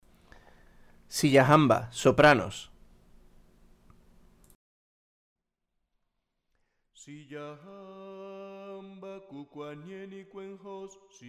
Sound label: siyahamba sopranos; Sanctus de la Misa de Requiem; Faure